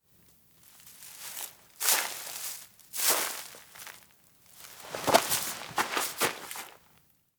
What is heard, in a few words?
dry leaves turn; anemoi